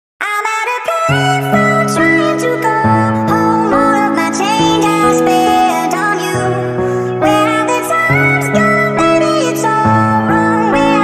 drill

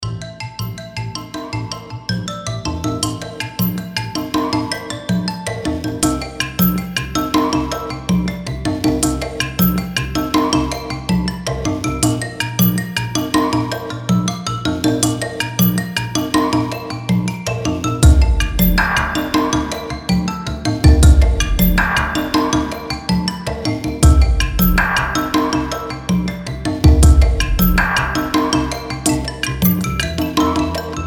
drums

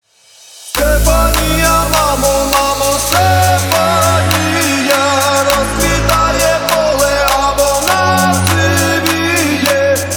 remix

